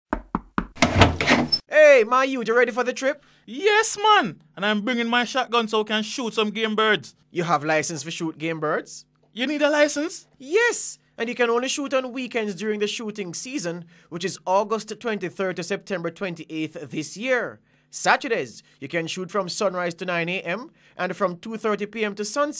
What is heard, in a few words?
bird shooting